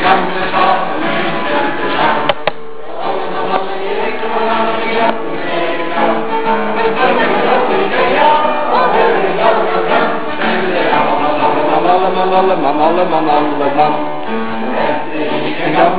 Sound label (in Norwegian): Studentersang; Utmatrikulering